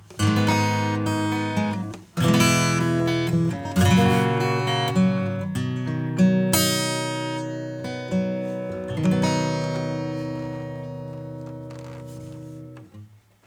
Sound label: Harmonics acoustic SOLID